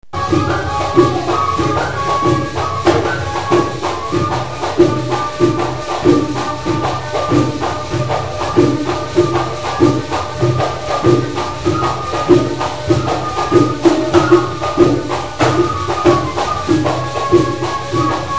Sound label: tanoura (tabla, duff, nay, rebaba)